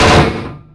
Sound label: Fab Boss Hit; The Elder Scrolls III - Morrowind